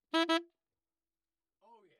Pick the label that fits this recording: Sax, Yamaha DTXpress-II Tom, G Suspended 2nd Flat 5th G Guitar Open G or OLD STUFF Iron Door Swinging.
Sax